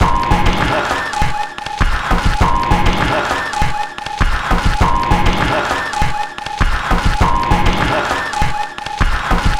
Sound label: Paper Loop; Sonic Foundry (Sony Creative Software); Esoterik Beatz